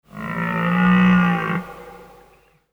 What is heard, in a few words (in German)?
Trailer Bumper und Stinger; PRIMATON Radio Hashtag plus; 9 Pointer Hirsch